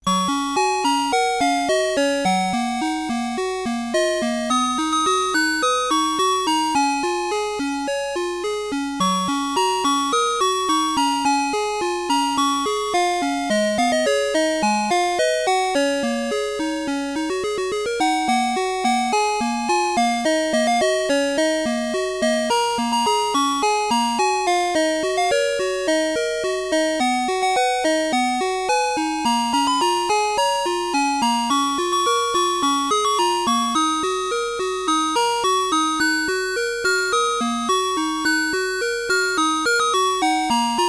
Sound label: 8 Chord A